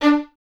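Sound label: Violins D 4 staccato left; Miroslav Vitous; String Ensembles; 11 Violins; 11 VS Stacc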